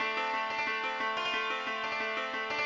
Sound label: ethno, 90, D